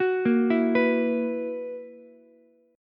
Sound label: Gb 11th, Ukulele, C6; Gb11 arpeggiated; JGuitar